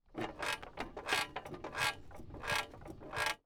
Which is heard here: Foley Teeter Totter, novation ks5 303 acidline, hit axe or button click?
Foley Teeter Totter